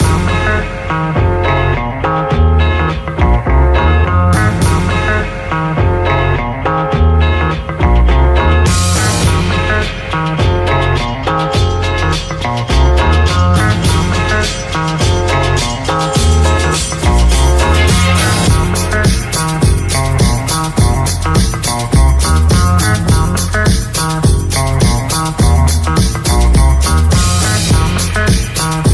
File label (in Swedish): Jingel Musik